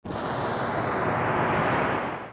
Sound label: FLAME; KRONDOR